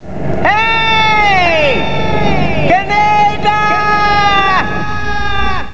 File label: falling; openffa